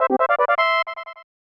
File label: Key Item Fanfare; Super Nintendo; EarthBound